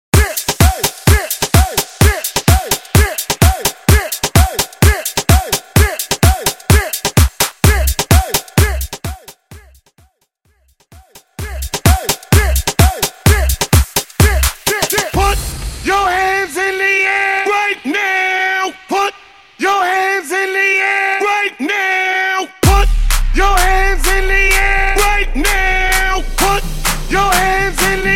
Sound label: Big Room